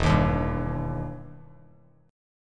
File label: E Minor Major 7th E down Bass Standard; EmM7 strummed